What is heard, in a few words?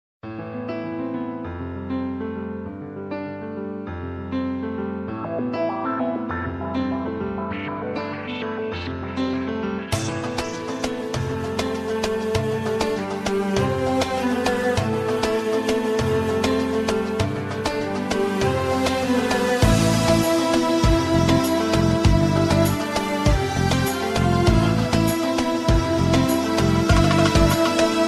best piano tone